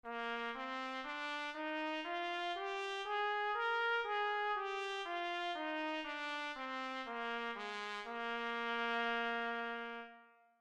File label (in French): gamme cornemuse